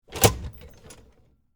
Door Open Exit, Foley, Door, Open, Exit, Push, Bar, Stage; BlastWave